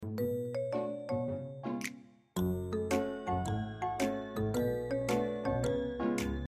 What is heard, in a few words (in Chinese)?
背景音乐